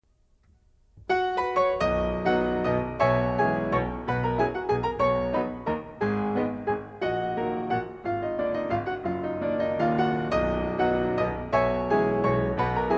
waltz; Brahms

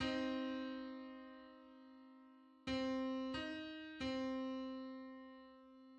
Hundred-fifty-third harmonic on C

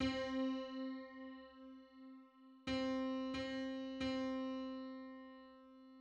Five-hundred-seventeenth harmonic on C

